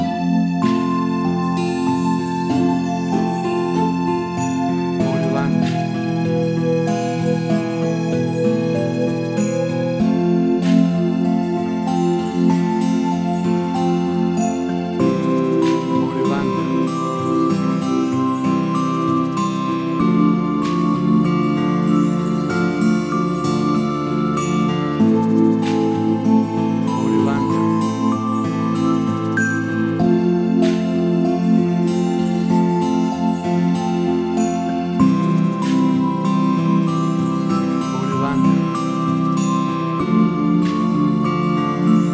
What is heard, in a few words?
Babies Music Theme